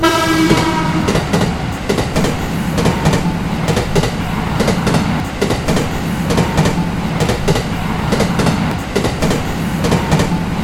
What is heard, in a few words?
train testsound 44100 Mono Original; OpenAL; doppler effect